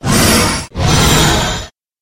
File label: monster wyvern